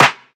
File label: phat steel snare drum sample C sharp key